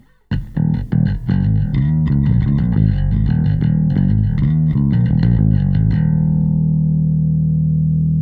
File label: bass; Autoformer